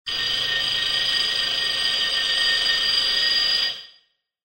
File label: clock